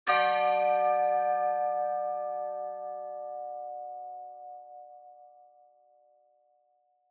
Church Bell High Short

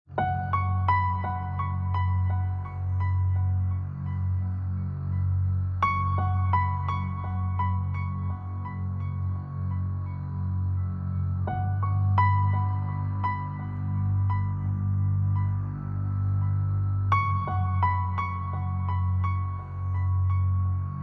Social Documentary

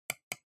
PC Mouse L

